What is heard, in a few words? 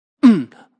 Vocal Funk Mmm, Funk vocal loop sample.; Loop Vocal Funk Mmm 110bpm; Music Production Loops